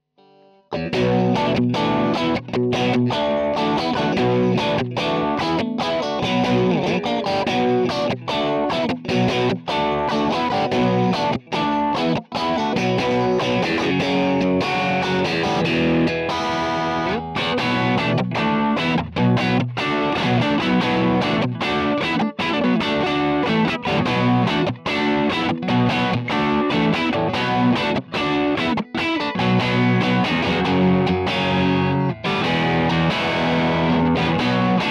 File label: 69 Plexi Strat